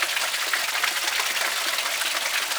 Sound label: water fountain; Golden Crayon Sounds